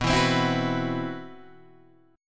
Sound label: C-Major Sharp 11th B, guitar